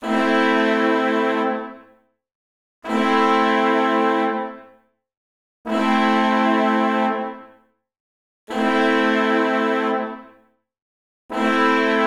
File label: 170 BPM A Min Horns; Cymatics; 2022 Melody Collection; Revenge